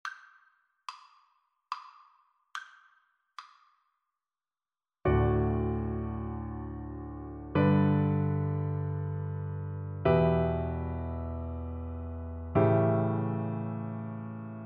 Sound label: we wish, Double Bass